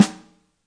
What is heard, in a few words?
real snare, REAL LIVE KITS; A Real Freakin Drum Kit